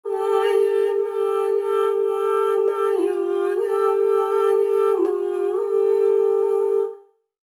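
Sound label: Music Chant; Curse of Atlantis: Thorgal's Quest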